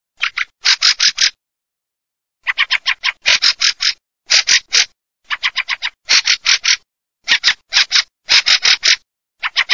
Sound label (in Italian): CESENA CIACIA